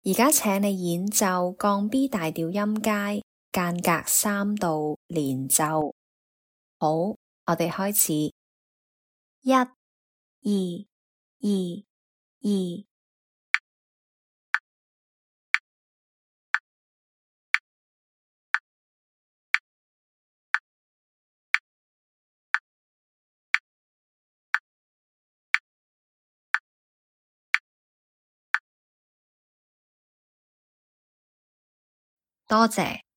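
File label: B flat major scale 3rd apart legato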